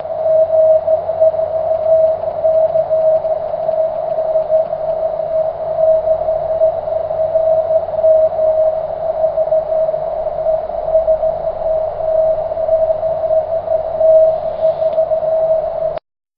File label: cw; 23cm